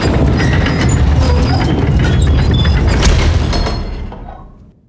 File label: door close; DAoC; Toa